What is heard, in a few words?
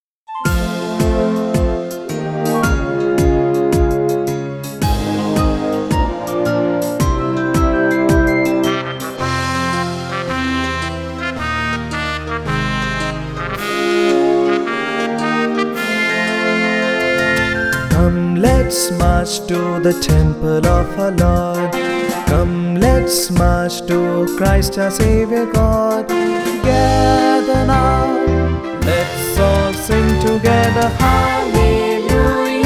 Marching Song